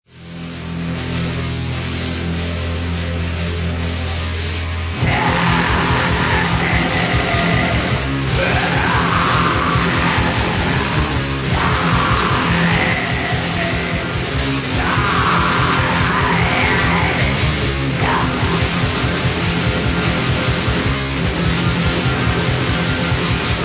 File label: punk